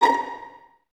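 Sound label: violins A#5 pizzicato, String Ensembles; Miroslav Vitous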